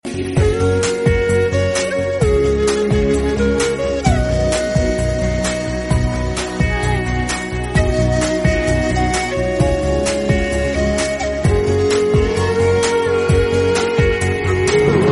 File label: flute ringtone